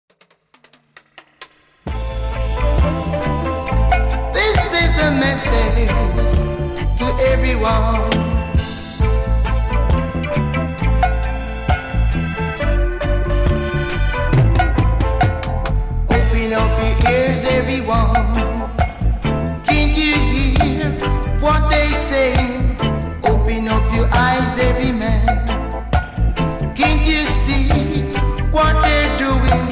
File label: Reggae Explosion